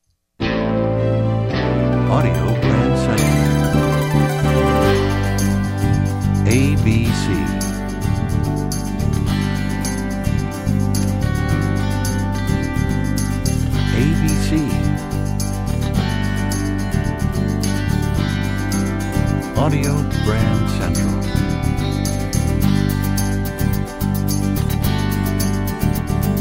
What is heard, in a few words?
Desert Wind, radio jingles